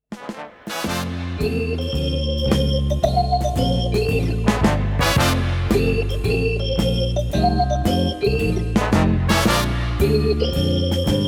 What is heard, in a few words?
Blues